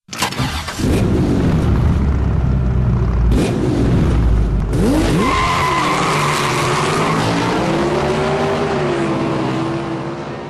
startup n run

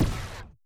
device disconnect

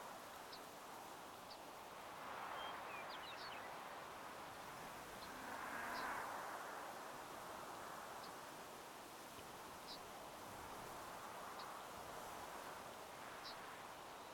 ambient temperate

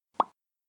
POP

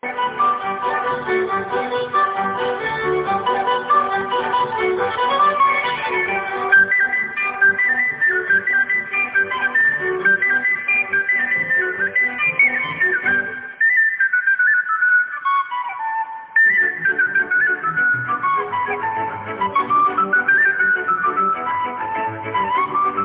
Fujara

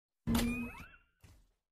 turn on repulsors; Iron Man

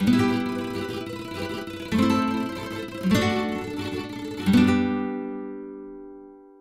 karaoke

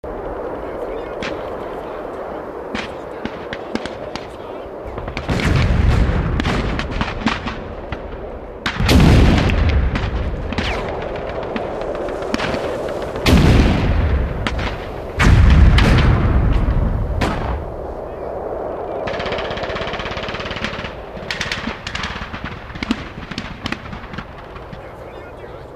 Amb Snow Battle Ext

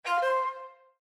Hardware Insert; Windows

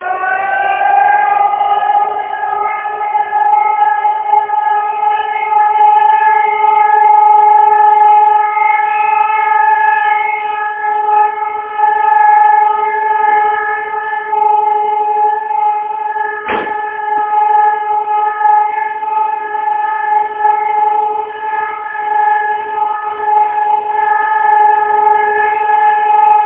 siren; Skuska

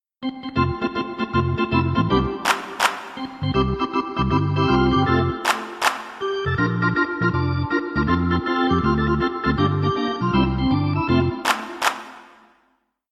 Arena Organ

